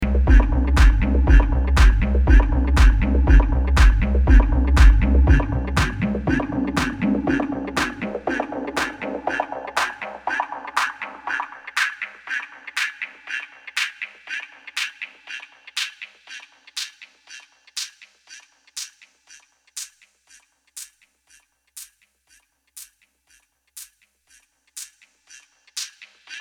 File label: Pioneer Toraiz SP 16 High Pass Filter